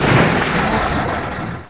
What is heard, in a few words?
TANK DIE